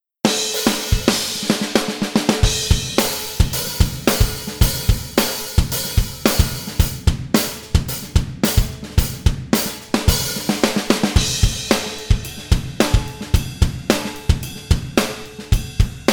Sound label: Wet Room; AVATAR